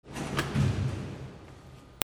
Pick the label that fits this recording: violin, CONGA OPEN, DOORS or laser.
DOORS